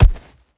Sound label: Enemy Fall; Game rip; MiSide